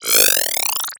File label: Multimedia Internet CD-Rom Flash Whoosh Electronic